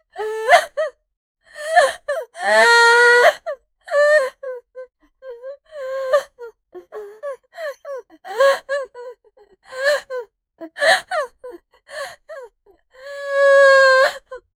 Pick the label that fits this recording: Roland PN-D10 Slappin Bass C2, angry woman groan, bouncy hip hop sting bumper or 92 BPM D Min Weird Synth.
angry woman groan